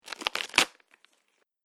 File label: Ripping Paper Bag; Ripping Sound Effects; Foley Sound Effect